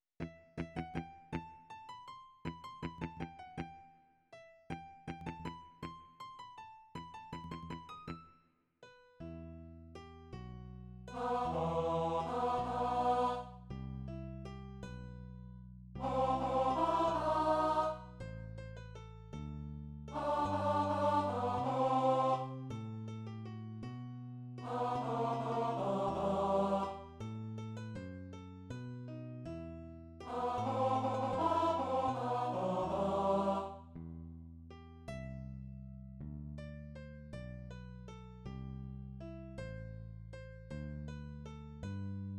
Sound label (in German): Tenor